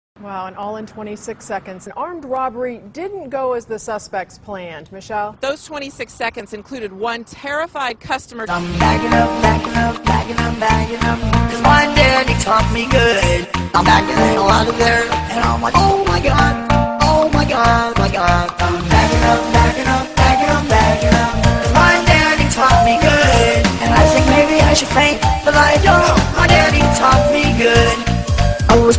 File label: Backing Up Song